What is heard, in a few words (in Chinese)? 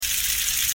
消防栓-水枪声; LaboratoryProtection